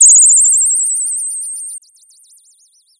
Sound of a bird tweeting nicely

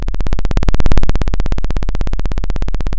Sound of a chainsaw

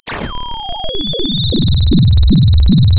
pop

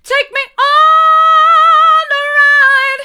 take me on a ride hard, vocal

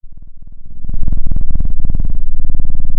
pop, Sound of a cat purring

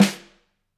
K2 room Snr, drum

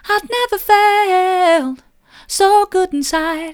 never felt so good inside soft, vocal

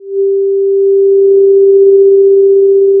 pop, Sound of a cruise ship horn

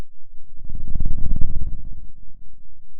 Sound of a dinosaur

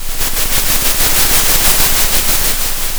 center, Sound of a train

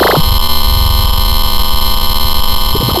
pop, Sound of a cat meowing

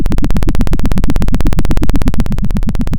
pop, Sound of a helicopter

